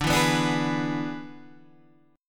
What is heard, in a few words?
E 7th D, guitar